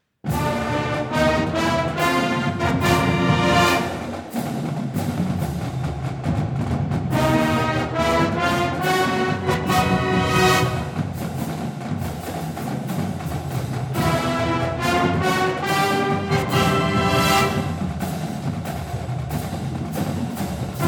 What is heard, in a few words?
Fanfare and Fight Song, Marching Band; FHSU Gridiron; School Songs